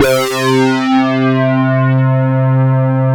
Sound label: SYN JX P Sound; Best Service Gigapack 1